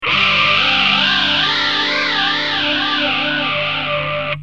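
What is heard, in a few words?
guitar, final phase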